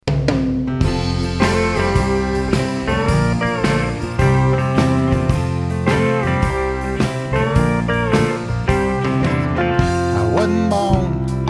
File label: country band